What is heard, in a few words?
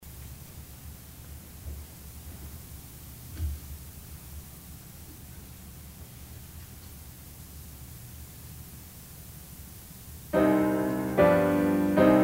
Choir; taiwanese